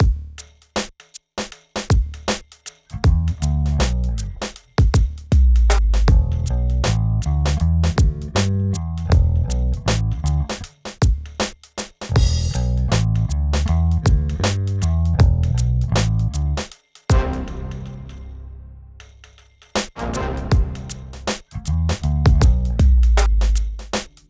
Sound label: Bounce Bass